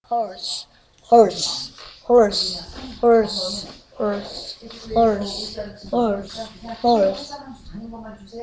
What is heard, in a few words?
horse